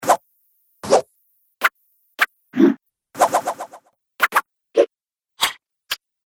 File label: Weapon Swishes; Miscellaneous Combat Sound Effects